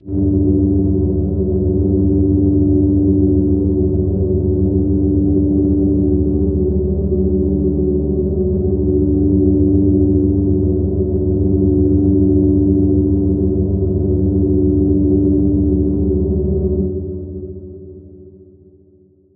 Ambience

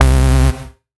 Bass C, techno synth shot; Ghosthack; Techno Synth Shots 2025

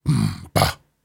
Grunts and Chants, Viking Vocal

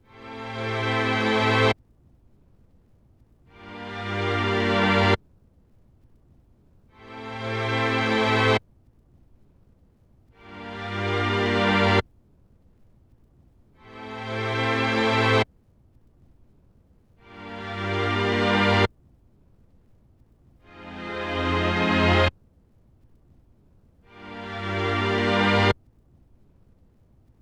Tape Strings Am7 70Bpm, Lo-Fi; HipHop; Construction Kit; STEMS; Ghosthack; Ghosthack Advent Calendar - Day 4 - Lo-Fi Kit

